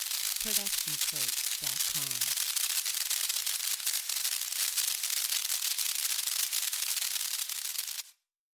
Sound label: fuse; soundscrate